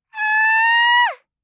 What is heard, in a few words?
kyaa; gaya